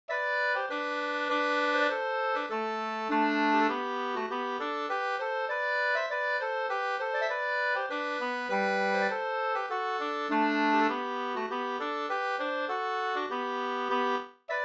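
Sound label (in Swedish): polska, tvåstämmig; 1814 fr Sd Rosenberg 1-124 2st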